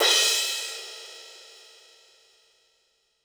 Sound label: Korg 01W Dance Kit; 300 Drum Machines